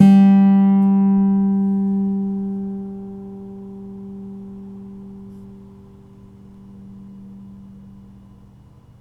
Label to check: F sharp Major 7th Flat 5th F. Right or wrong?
Wrong